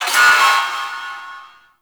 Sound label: Ship Billboard Trans In; Gameplay; Ambience; Warframe; Corpus Ship Remaster